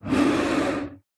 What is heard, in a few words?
vox idle